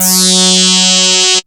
SWEEP F#2; Best Service Alpha Dance vol.2

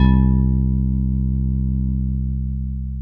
DW8 EP C1, Korg; Back In Time Records; Korg Universe VOL-1

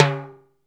RX TIMBAL LO; PERCUSSION; Back In Time Records - Big Bang

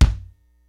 LIVE AC KIT, DRUMS; Kid Nepro; Elite

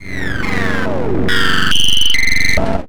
weird; Best Service - XXL Production Effects

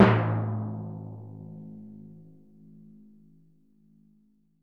TMP A#, Synclavier Percussion

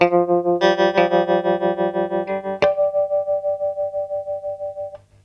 MELO, GTR LOOPS; USB Soundscan vol.31; Miro s Grooves; AKAI

